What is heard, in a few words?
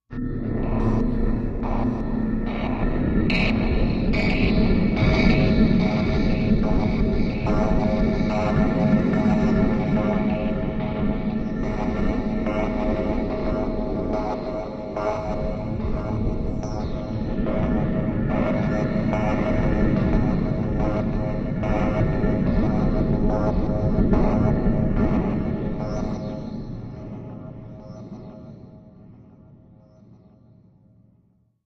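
Fluid Buzz Electric Hum, energy beams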